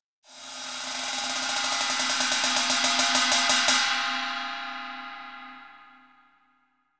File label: ride